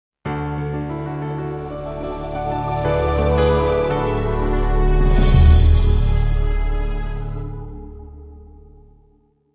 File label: Soft Movie Logo